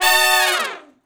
Med Length Falloff (B), horn sections; Crimson Blue and Fabulous Horncraft 4 RnB

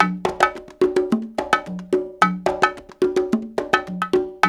World Dance

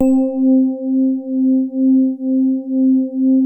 SWEETER C3, E-PIANO; Music Store - Claudius Diemeter Schwerlos

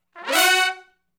Hi Rip Up (F#), Horncraft for R&B, Sections; Sony Creative Software; Crimson Blue and Fabulous Horncraft 4 RnB

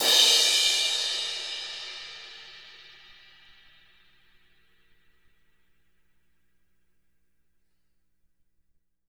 CRASH

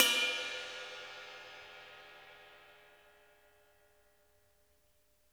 bell, drum kits; Sonic Reality; Interactive Drum Kits

